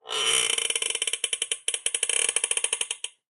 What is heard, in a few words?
Chair Squeak